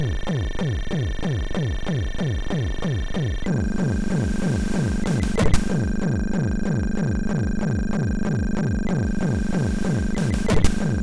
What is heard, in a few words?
Heavy sid MOS6581; hvsc